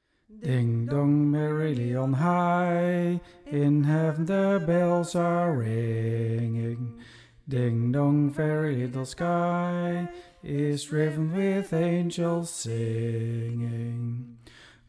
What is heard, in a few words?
Ding-dong BAS